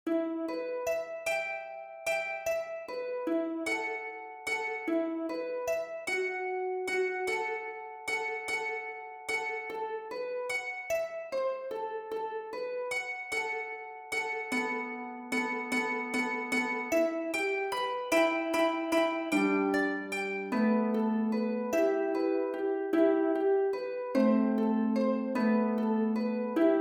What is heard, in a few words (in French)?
HARPE; Il est bon de louer